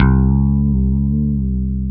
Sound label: BASS, Drum & Bass, 135 BPM; Ueberschall; Drum & Bass Resonance